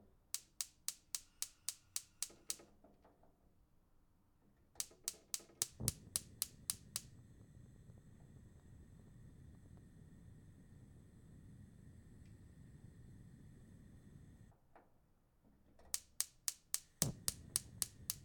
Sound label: Gas Stove